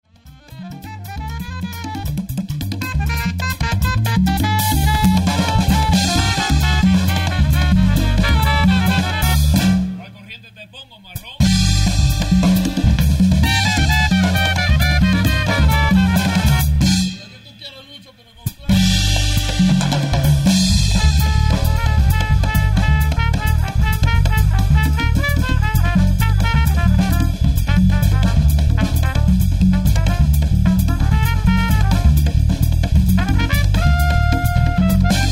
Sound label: Trumpet Solo And Interludes